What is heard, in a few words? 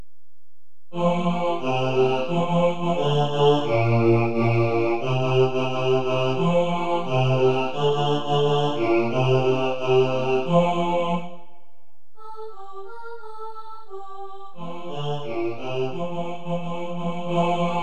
bass, choir